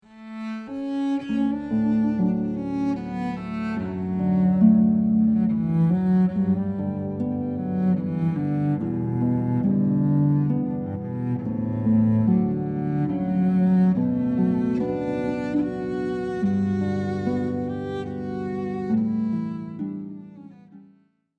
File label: harp flute cello